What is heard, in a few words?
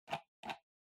PC Mouse Far Scroll Fast